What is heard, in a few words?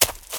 STEPS Leaves, Run, High Quality Footsteps